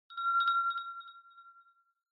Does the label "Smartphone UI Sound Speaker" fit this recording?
Yes